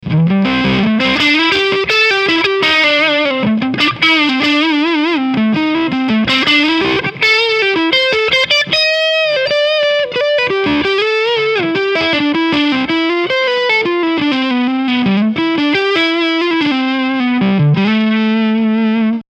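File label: Front SC Overdrive Booster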